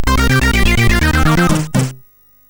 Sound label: MOS6581; Demogame Example